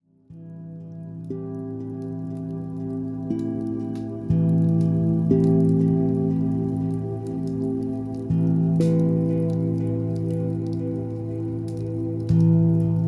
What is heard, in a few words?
Relaxing Music